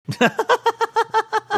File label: laughing